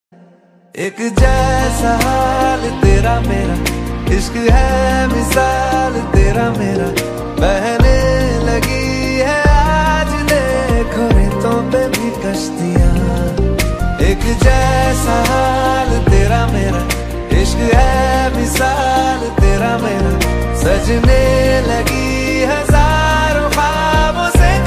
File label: song ringtone